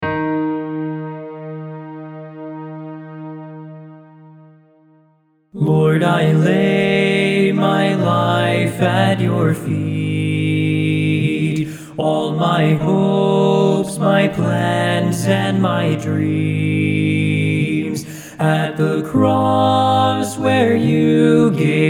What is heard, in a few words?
LEAD